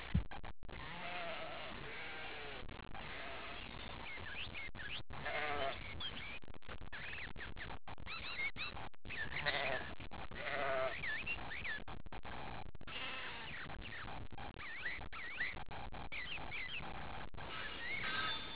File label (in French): moutons forgerons scie; voyager